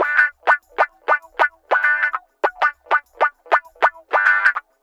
Guitar Loop; Ambient Grooves

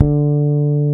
BASSES, Roland

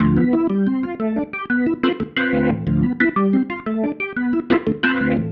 Pattern, Organ

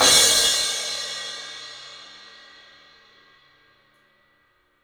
CYMBALS; 3D Sound; 3D Drums

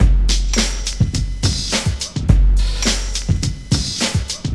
LOOP4 L, Drum Loops; Big Fish Audio; Prosonus 500 Drum Loops

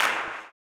CLAP, Blues Soul; Spectrasonics Vocal Planet

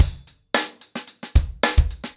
110BPM, acoustic drums; The Roots Drumloops

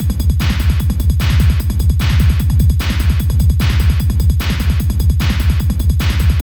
LOOP, Tekno Industrial; East West

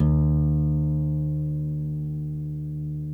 NYL NV E, nylon no vib guitars

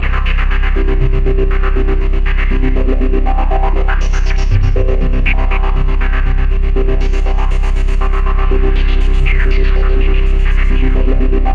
Roland JV 2080, poly saw

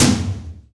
TOM TOM, Roland

